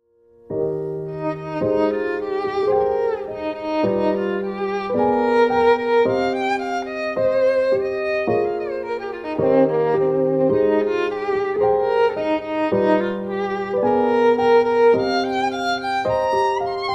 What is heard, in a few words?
Violin Meme